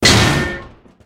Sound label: Boss fight Obstacle falling on the road; Talking Tom Gold Run